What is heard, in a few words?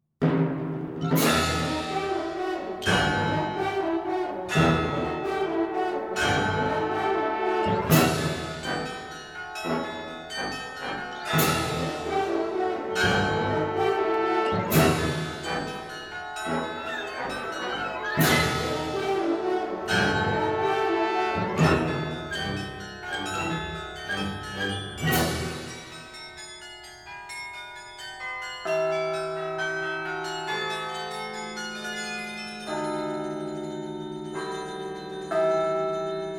starbells